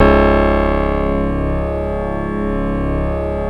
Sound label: C#, PIANO; Big Fish Audio; Synclav FM